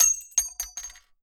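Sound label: bullet case bounce 50bmg glass; gmod; viper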